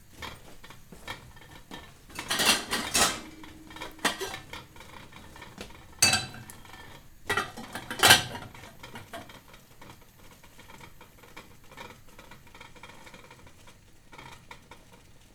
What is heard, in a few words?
Kitchen saucepan lids